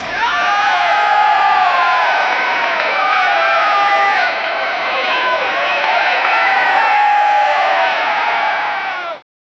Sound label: in a large arena setting